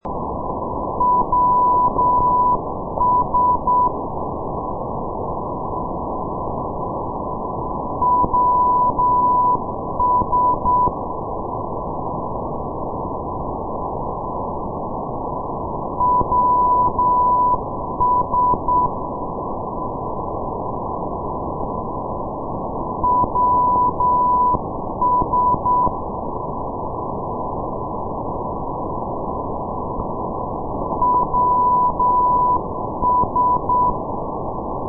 NDB, ndb starokonstantin; europe

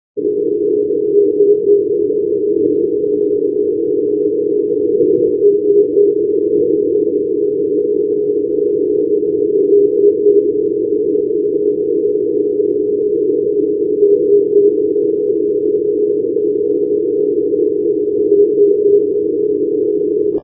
ndb mariehamn; s-305; tjusvik; europe

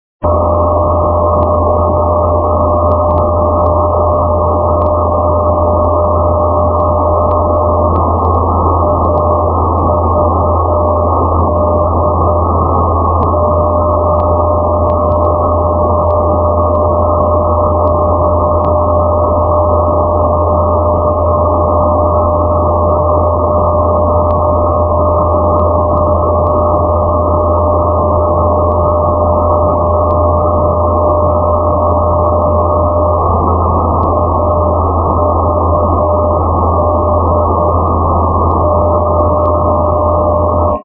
ndb avord; paris; europe

